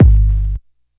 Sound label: roomy kickdrum sound g sharp key, bass drum sound tuned to the G# note; kick drum samples